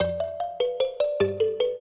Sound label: trio, mbira; Mozart